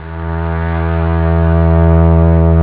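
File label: slow metal, instruments; sonix; Rise Of The Dragon; chipmusic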